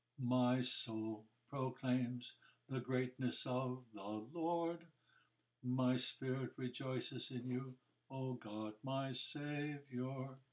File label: Magnificat D